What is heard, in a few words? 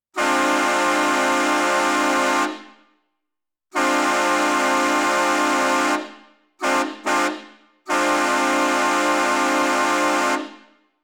Shocker 6 bell